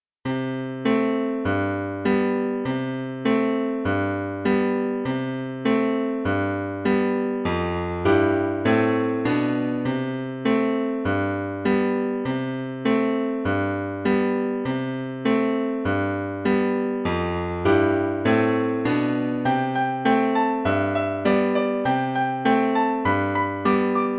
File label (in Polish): cwiercnuty i osemki; Nagrania Lokomotywy